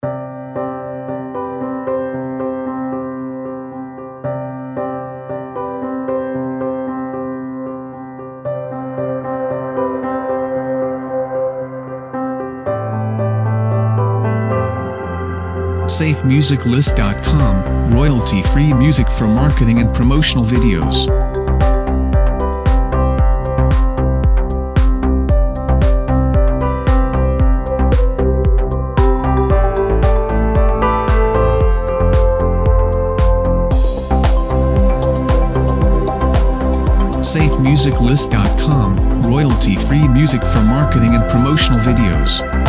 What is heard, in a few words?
Inspirational Waves; SafeMusicList